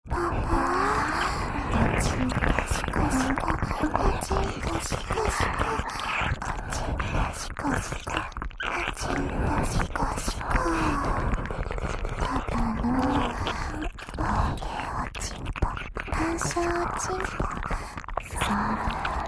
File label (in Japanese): 両耳れろれろ舐め; おまけループ（オナサポ）; 『パパのざぁこ♪ざぁこ♪』; 実の娘にバカにされたい！